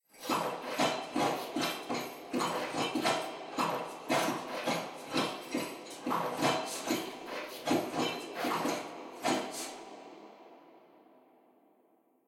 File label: dance